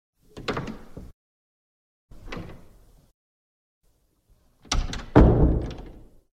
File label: Door